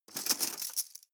cutlery teaspoons drawer grab

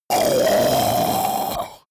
monster choking zombie dying; comedy-cartoon; soundpool

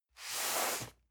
cardboard box medium slide; objekte

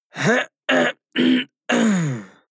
harrumph male hard long; koerpergeraeusche; menschen; soundpool

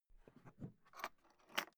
briefcase plastic open metallic rattle; industrie wirtschaft; bueroarbeit

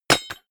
drop metal stone; hits explosionen; metall

